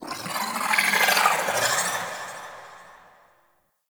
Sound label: Sentient Lair Exterior Creature; Warframe; Ambience; Misc Creatures